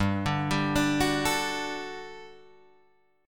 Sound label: G Major 7th Suspended 2nd G down Guitar Drop D